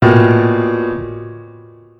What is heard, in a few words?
piano A2 Bb2 B2